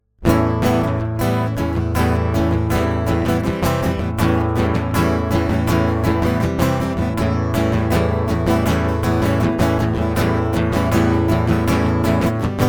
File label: acoustic